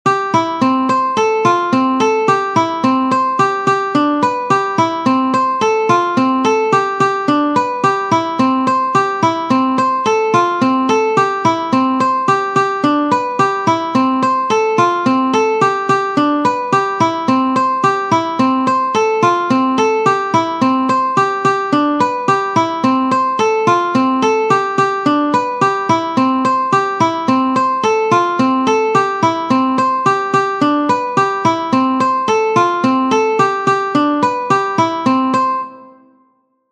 Normal Picking Pattern; Shepherd s Hey

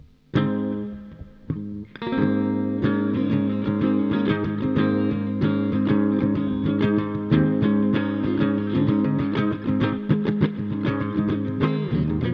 GTR Clean All 3 pan; 97 Percent Fire Studio

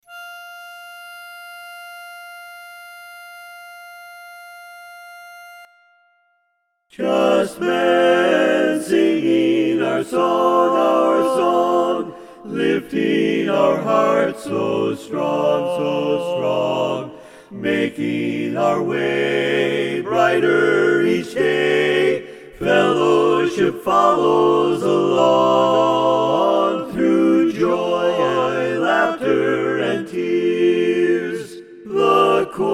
Just Men Singing Our Song; Full Mix

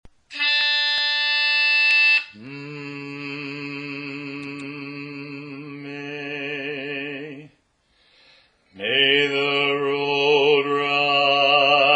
An Irish Blessing - Bass

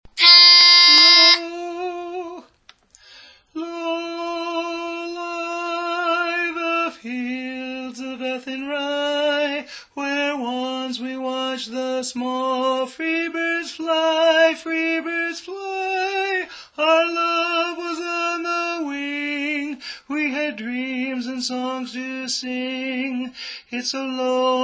Tenor-High

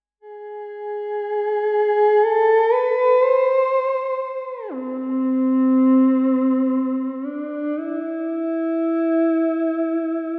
Theremin; Madame Theremin

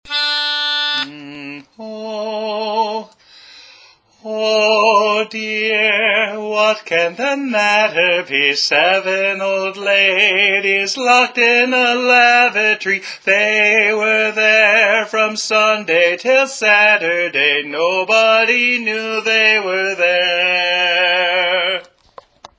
What Can The Matter Be - (Tenor)

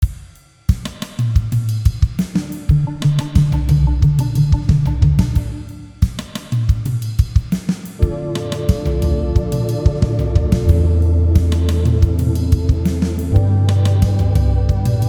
Brooding Percussion

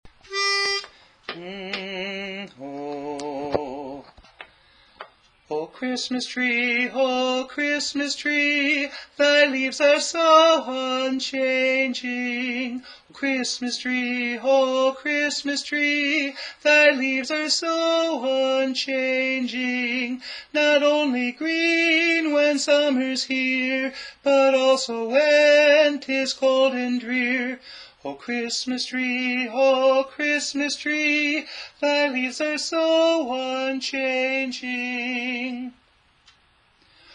O Christmas Tree (Tenor)

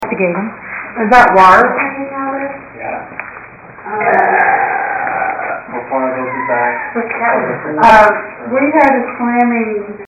breath squeal

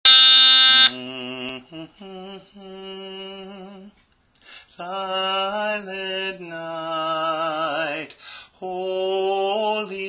Lead; Silent Night

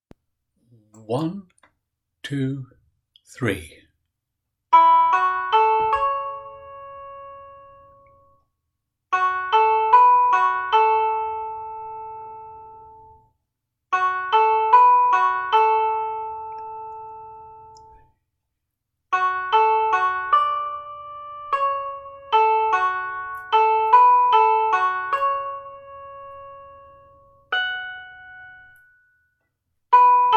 Instrumental

